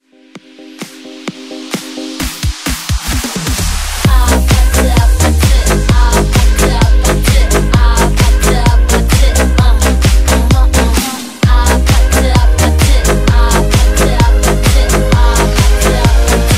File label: Basshouse